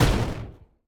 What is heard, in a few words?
shoot; Mindustry; Scepter